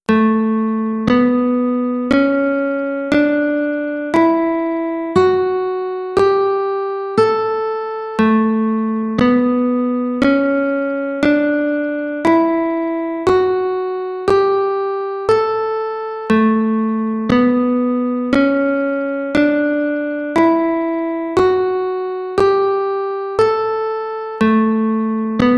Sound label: A Mixolydisch Scale Guitar